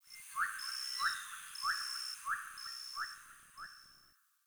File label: Tree Critters, Ambience; Props; Warframe; Duviri